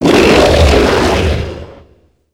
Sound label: baron death; doom4